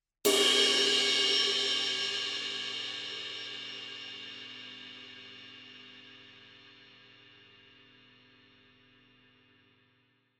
Mellow Ride 22 Edge; Signature; New Instruments 2021

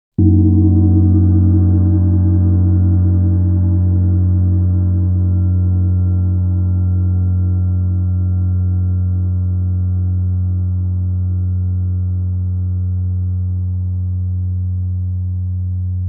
center, gongs

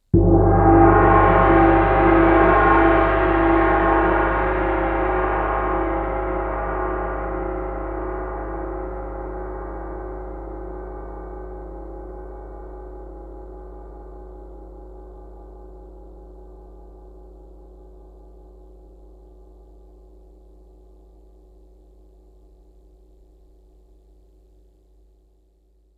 G3 Center, Gongs; Erde

